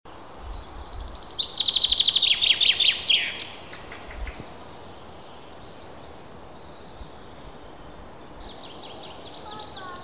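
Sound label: zyablik voice, chaffinch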